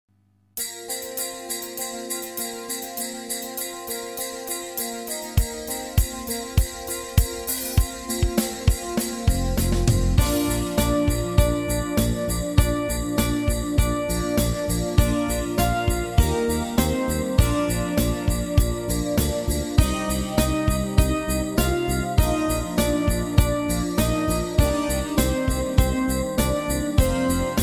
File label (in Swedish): Bjällerklang mellan; trumpet